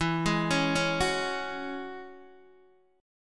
E 6th Add 9th E down Guitar DADGAD

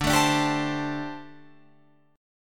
D 7th Suspended 4th, down, Guitar, Open F; D7sus4; strummed

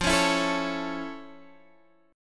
F Augmented Major 7th, Guitar, Half Step Down

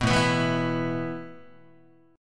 A Suspended 2nd A Guitar; Asus2 strummed

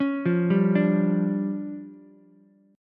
D Minor Major 7th Double Flat 5th, D, Ukulele; DmM7bb5; arpeggiated; JGuitar

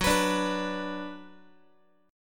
Gb Suspended 4th Sharp 5th Gb, down, Guitar; strummed

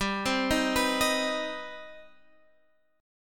C Minor Major 7th, G, chord

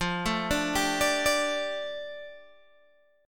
F 6th Add 9th Guitar Open G, chord

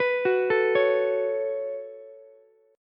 Db 7th Suspended 4th Sharp 5th Db down Ukulele; JGuitar

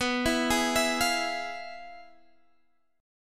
F Minor Major 7th Flat 5th, B

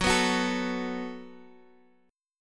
F sharp Minor Double Flat 5th, Guitar, Open G; chordshape; down

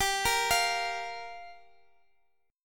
G Minor 7th; Gm7; arpeggiated; x,x,x,12,11,13

